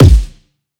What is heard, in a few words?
kick energy; Drum Sample Pack; One Shots; Slate; SEISMIC